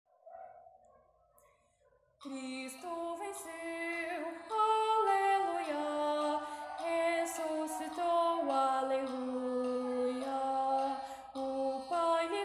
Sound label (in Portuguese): contralto